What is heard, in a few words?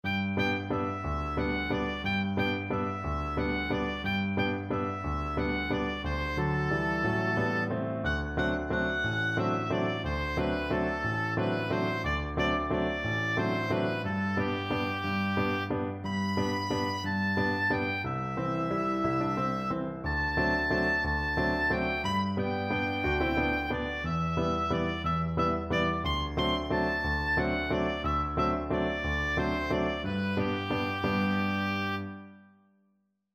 cielito lindo, oboe